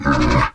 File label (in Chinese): 骆驼环境声